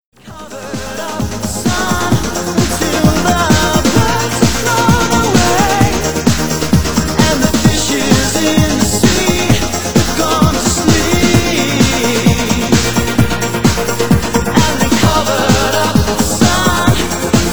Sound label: Chorus (Aggressive Trance Mix)